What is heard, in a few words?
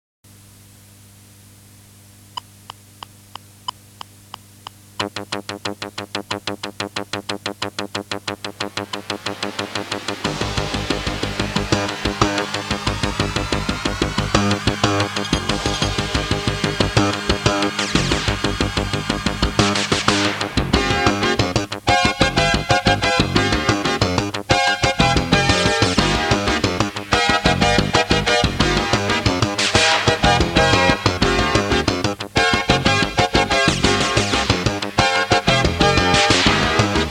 Sports car jingle without drums